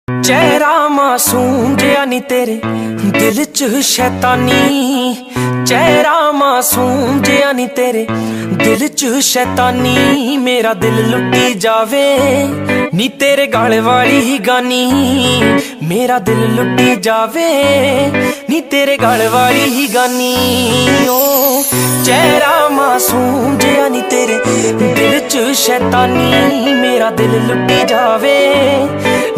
ringtone, punjabi ringtone

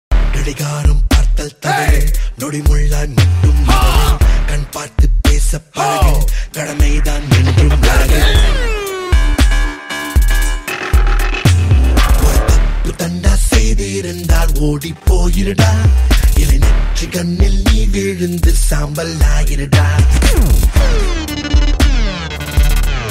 theme song; yennai arindhaal

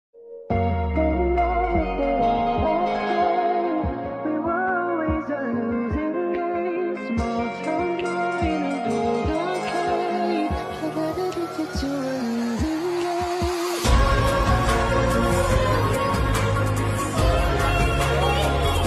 tamil love ringtone; caron tunes

